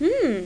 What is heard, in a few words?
hmm; Tetris Collection for Windows 98